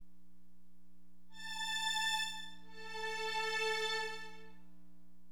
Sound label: STRING, HipHop, Keyboards; LiveAct Pool 1